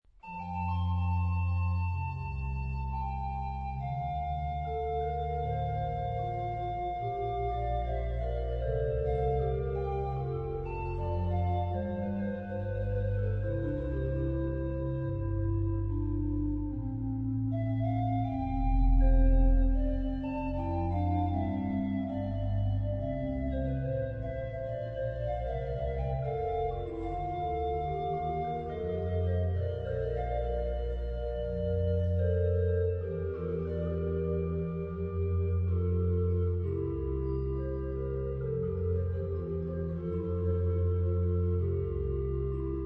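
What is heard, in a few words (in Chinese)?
鋼琴; HORTUS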